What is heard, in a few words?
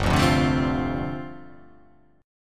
G 9th Suspended 4th